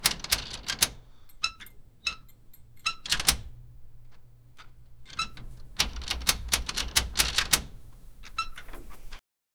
mechanical technician sou, repair

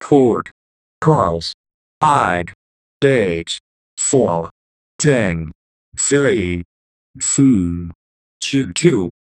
create a countdown timer

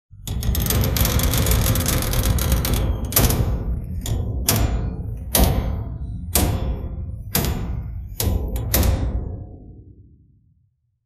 several images, closure

